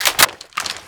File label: reload chamber, foley; sa80